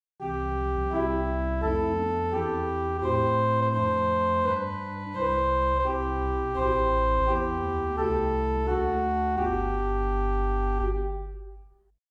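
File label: Open Diapason large solo, organ stops; Swell: Rohr Flöte 8' Great: Open Diapason Large 8' Pedal: Sub-Bass 16', Swell to Pedal 8'; St. Anne; Hauptwerk; St Annes Moseley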